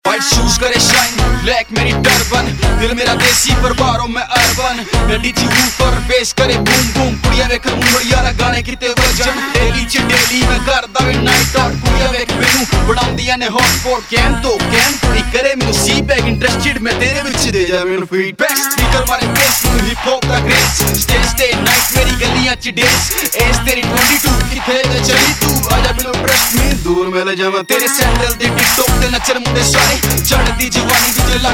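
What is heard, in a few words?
Intro Ringtone, Punjabi Pop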